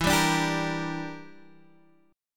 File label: E Minor 7th Flat 5th